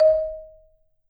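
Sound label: Marimba medium F D#, marimba; Sampleheads - Dave Samuels Marimba & Vibes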